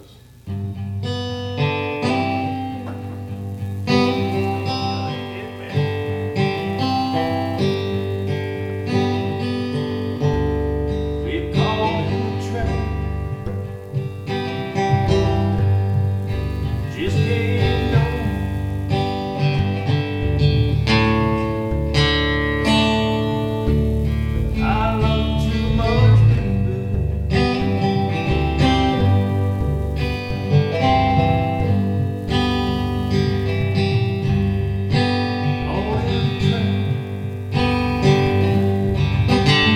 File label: Jam